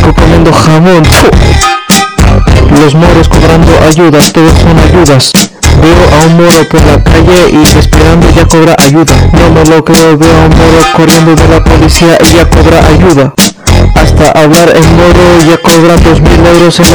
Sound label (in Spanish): rap